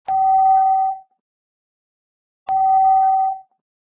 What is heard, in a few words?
floor, ambient tones; Source Engine; cstrike